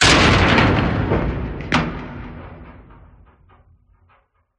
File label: Metal Impact Crash Hit; PMSFX